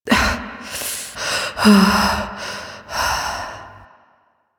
Breath; Fight Lotus; Warframe; The New War; Throne Room

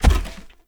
King Step Default, Footsteps; Enemy; Mendicant; Mnd King

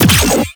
Detron Power Layer A; Warframe; Weapons; Corpus; Lich

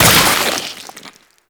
Inf Arm Cannon Mech C; Warframe; Weapons; Infested

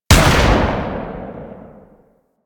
Laser Aim Rifle Alt Shot, Weapons; Grineer

